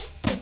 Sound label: click